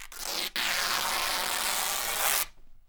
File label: RIP, Canvas Bag Rips